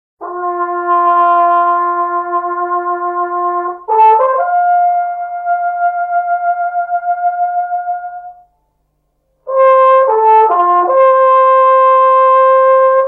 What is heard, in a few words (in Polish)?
Hejnal mariacki